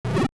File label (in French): son mur touche; Zelda; programmation labyrinthe